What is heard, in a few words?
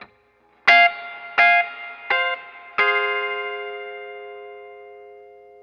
Guitar, 70s Analog Funk